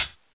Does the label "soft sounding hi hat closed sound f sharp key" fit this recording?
Yes